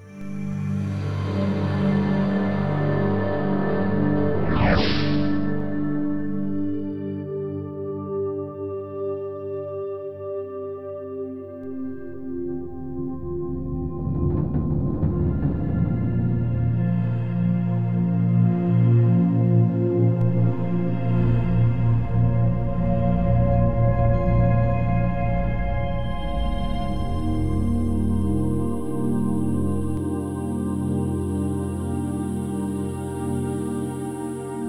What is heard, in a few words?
Begining music bed without beats